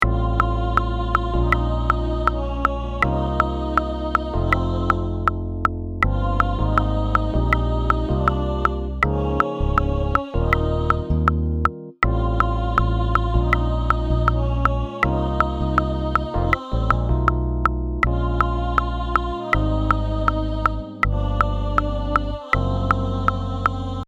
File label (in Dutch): laatste refrein tenor